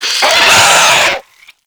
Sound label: Infested Biped Pain; Warframe